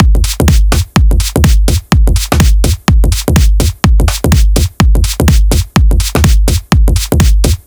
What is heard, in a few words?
Drums